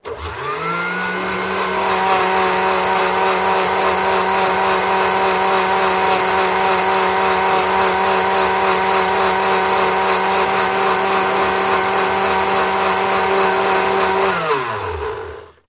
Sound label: Blender Strt Run Stp, appliances